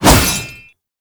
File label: Shield And Sword Whoosh; Warframe; Grineer; Mortifor